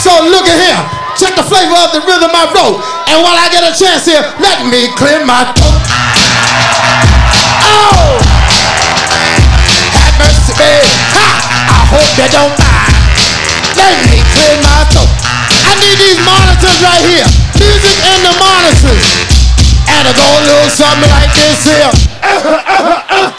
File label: clear throat